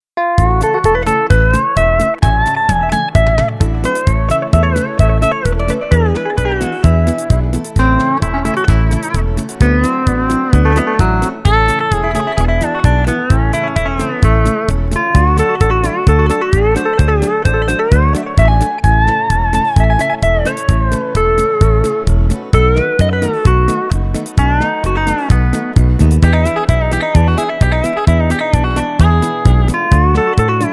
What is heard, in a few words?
Speedpicking, steel guitar